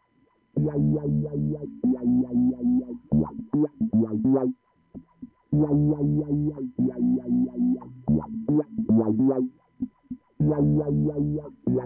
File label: wahoo auto trig